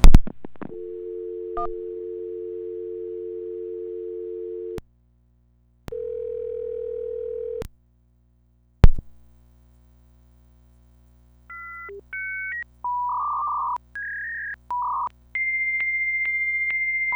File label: dial-up v90